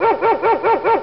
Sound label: barks, animals